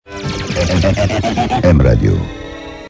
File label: jingle, radio; m radio